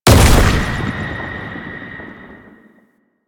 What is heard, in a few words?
Unyielding Gallop Hit; Warframe; Dagath